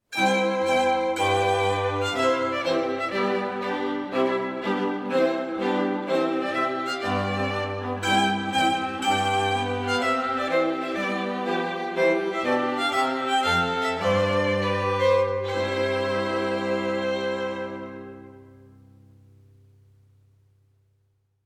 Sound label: trumpet tune